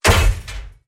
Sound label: deposit box break